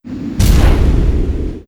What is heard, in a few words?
Skill Charge; FlyForInsanity